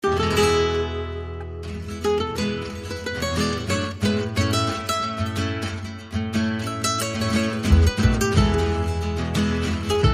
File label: El Mariachi, No Strings